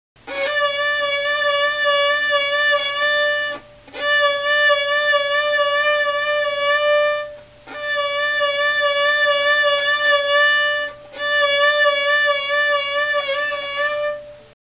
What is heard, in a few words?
vibrato, violin